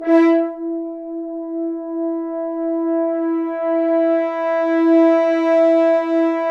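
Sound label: BRS FHRN FZ, French Horns sfz; Roland L-CDX-03 Disk 2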